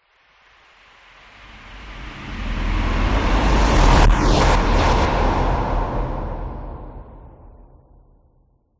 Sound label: whoosh; orlando lanzini